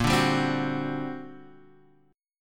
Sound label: G Minor Major 11th, A sharp